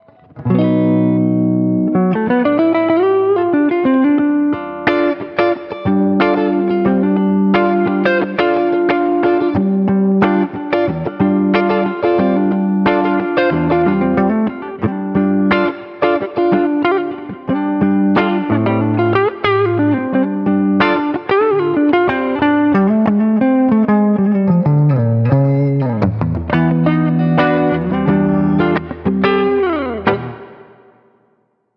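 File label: Neck HB clean, guitar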